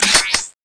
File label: long gun change